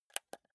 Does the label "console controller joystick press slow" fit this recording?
Yes